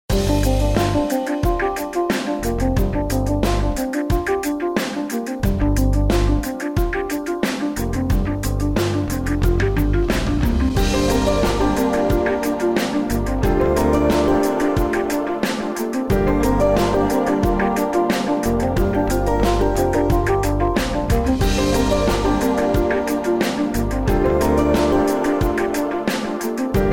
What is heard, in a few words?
Melodic Electronic; Communicator